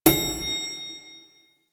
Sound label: Proto Infested Hover Ring; Warframe; Brachiolyst; Abilities